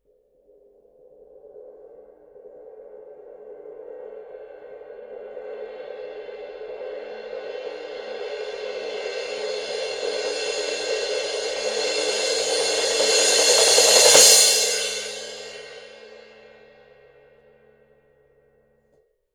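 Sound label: suspended cymbal roll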